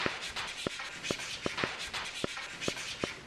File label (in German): an Tafel schreiben